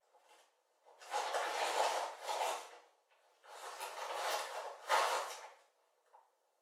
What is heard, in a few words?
Deployment Ambience Paper; Prison Architect